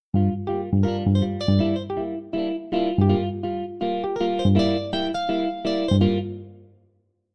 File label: jazz grtch ampd